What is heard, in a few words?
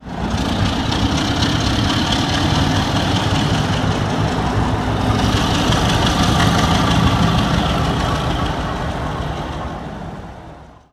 class 33; Simutrans; Pak128.Britain-Ex